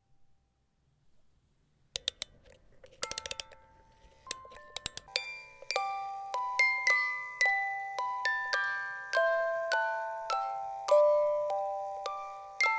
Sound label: Music box carol